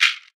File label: shaker one shot D sharp key, tuned to the D# note; percussion